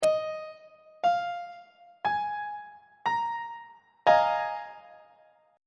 a sharp dominant 7th suspended 4th 1st inversion on treble clef